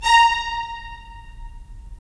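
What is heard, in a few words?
strings violins, right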